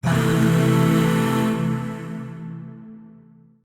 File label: Shawzin Pent Maj Chord A; Warframe; Zariman; Void Shawzin; Chords